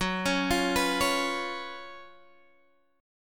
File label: Gb Major 7th Suspended 4th, Guitar Half Step Down